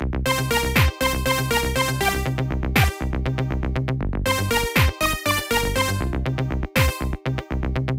Aerobics; Dreamer Series Top Model; nds

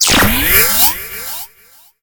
Charge Ghost Power

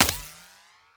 Hit Bullet Ricochet

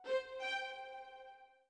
orbit violin; Sky Children of the Light gamerip

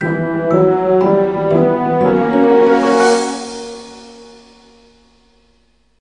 Jingle, The Door is Open; rayman 2

